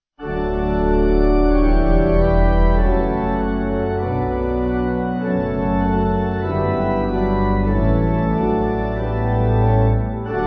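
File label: Kyrie Acc Pipe, Pipe Organ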